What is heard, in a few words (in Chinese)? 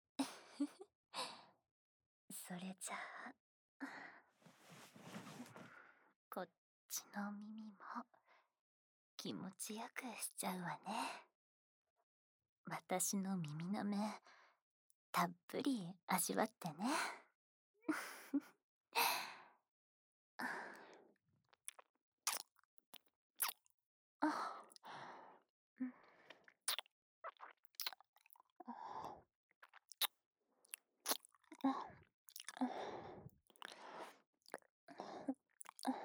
舔耳與低語（左耳）